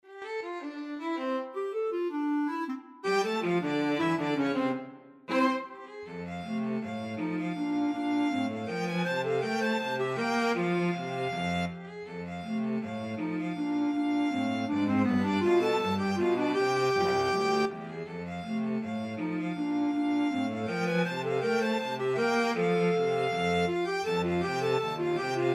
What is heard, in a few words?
violin clarinet cello